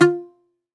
Greek Bazouki, plucked strings

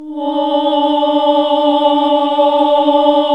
OHH D, Nuns Ooohh; Sound & Vision - Gigapack II

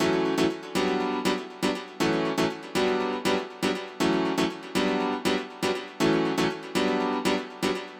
Piano Part, 120bpm D; Zero-G; Nu Disco; Happy People

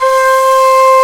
Nye Flute C4; Planet Earth; Digital Sound Factory Planet Earth

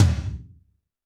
TD-20 Tom A, Roland TD-20 Toms

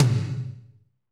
tom, Kit Wet; Northstar - Drumscapes Roland

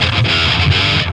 GTR; Roland - LA Composer vol. 2

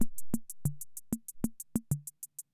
Ace Tone Rhythm Ace Loop, Drum Machines; 1967

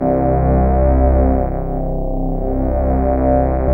SYN MORP, Roland; Sound & Vision - Gigapack II

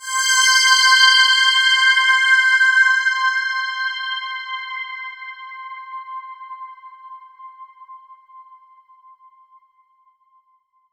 Roland JV 2080, interval; MG INTERVAL

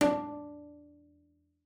D4, bass pizz; Xsample VOL-5

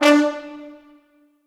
D3 L, Orchestral Brass

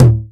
202 tom, Drums, Boss DR-202

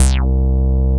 Bass Note C

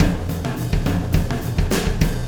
Wormhole Beat, Processed Drumkits; Sonic Foundry (Sony Creative Software); Zero Gravity Beats

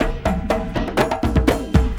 Elektro Into, Drums; Sonic Foundry (Sony Creative Software) - Friendly Fire; Marc; Steve

